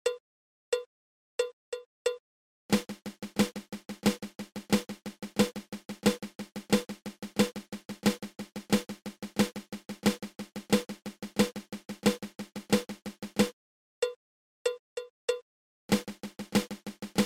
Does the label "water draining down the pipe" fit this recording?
No